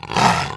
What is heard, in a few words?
Tiger Strike; Playable Tutorial Level; TR3